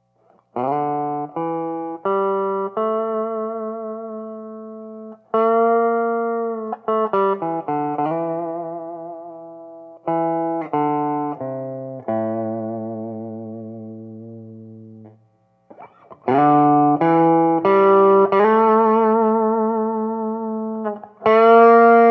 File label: simple blues line, ultra bandmaster